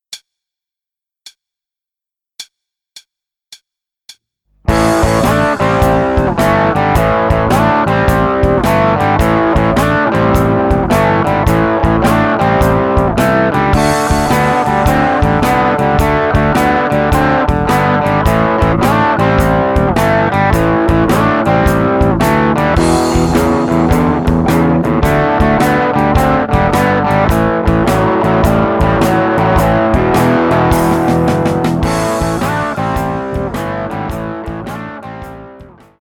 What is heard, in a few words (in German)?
Blues Rock, E-Gitarre